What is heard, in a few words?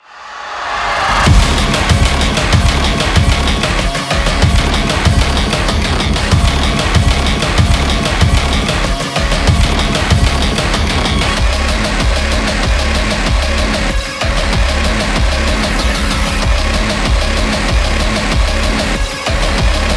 Main Heavy; Doom Hunter Base - Main Heavy 04